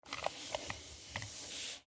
Pc Mouse Movement; Technology; Blastwave

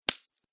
Coins Drop; Money

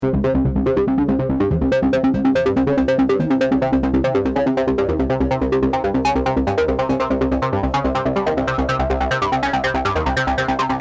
Techno Trance Supernova Loop In C 142 Bpm; Royalty Free Synth Loops

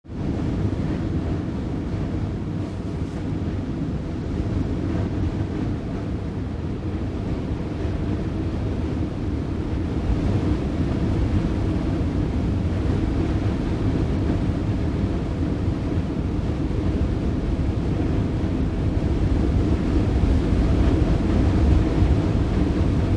Wind Open Strong Constant; Weather

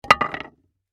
Impact Wood Drop, Small, Piece, On, Wood; Wood (Sound Effects:Materials); BlastWave

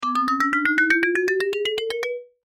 Cartoon Ascend Mallets; Cartoon Music; Cartoons; Blastwave

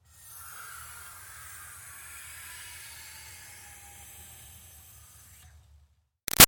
Car Highlander Trunk Air Hiss; 2005 Toyota Highlander V6; Vehicles; Big Room Sound

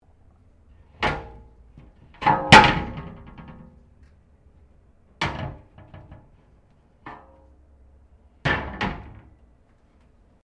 Door Pivot Interior Glass Cabinet Misaligned Open; Miscellaneous Doors

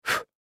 Human Blow Out Candle Breathe; Breathing; Blastwave

